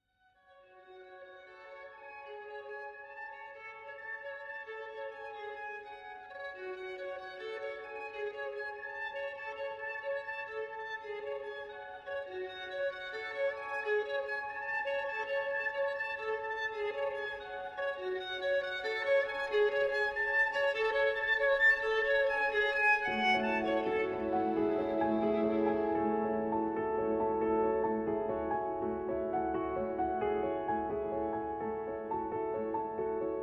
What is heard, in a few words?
Nocturne (Arr. For Solo Violin and Piano)